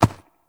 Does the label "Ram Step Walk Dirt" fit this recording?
Yes